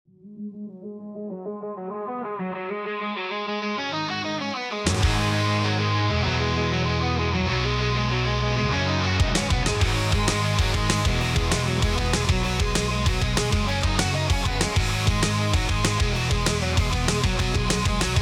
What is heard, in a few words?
rock